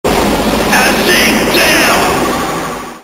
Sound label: sitdown